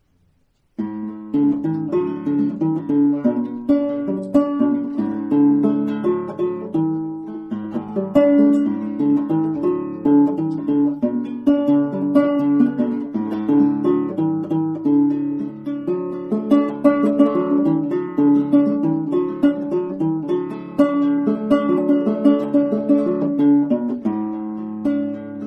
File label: how can i keep from singing, gourd